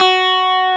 GUI STRATO, E-Guitar; E-GUITARS

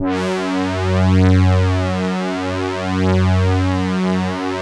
Elka Synthex Brass

